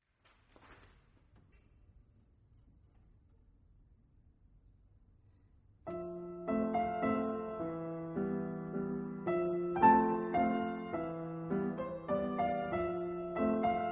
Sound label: Solo piano